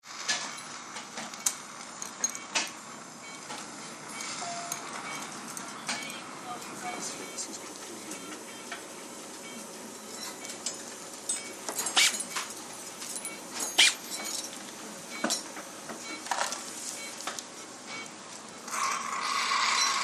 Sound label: HOSPITAL EQUIPMENT HOSPITAL: Operating room, general atmosphere with bone drills and suction.